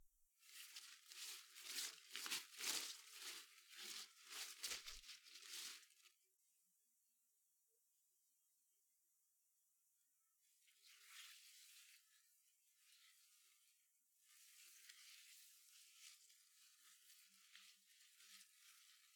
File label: Rustling Leaves